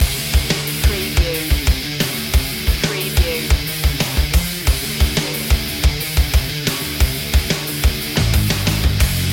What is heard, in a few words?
metal master loop